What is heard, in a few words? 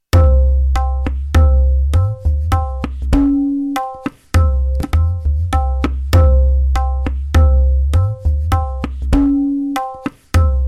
madhya 100bpm